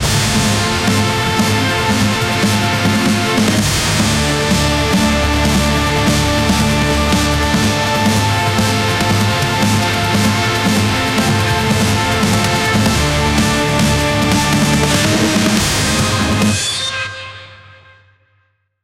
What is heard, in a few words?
a battle sound track, action